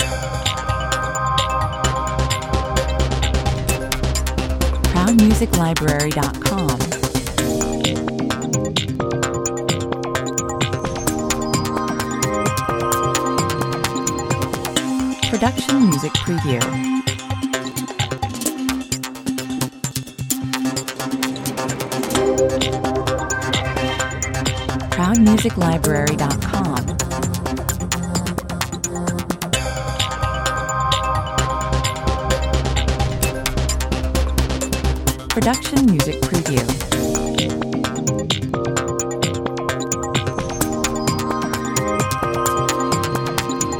Driving forward, still not restless, but very centered, many small fills and breaks, 130 bpm